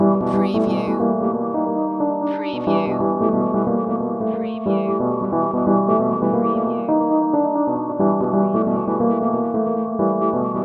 Hip Hop Dark Rhodes Licks 90 BPM Swing - G

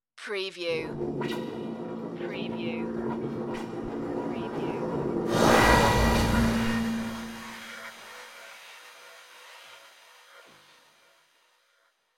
abstract passing mystery

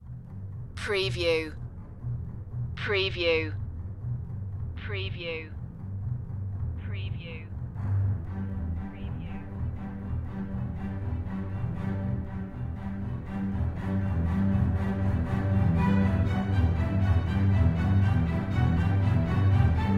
breakout stronger drums

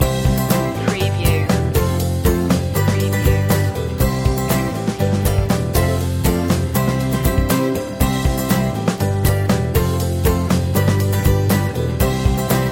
born to be happy loop

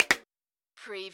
CARTOON CLICKS

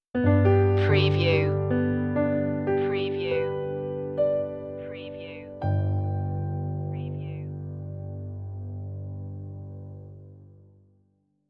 until we meet again stinger

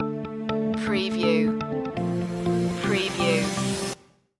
ibiza summer stinger

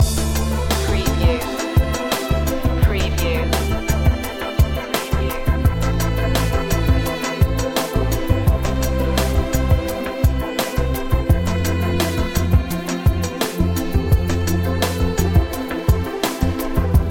chill loop

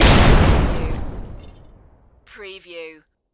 Explosion Auto; Sound Effects Bible

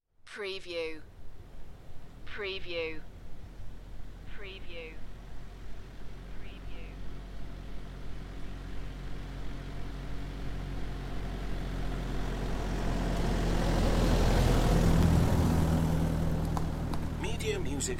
Welsh Forest car park drive by; beanstalk audio

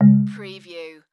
Multimedia Error

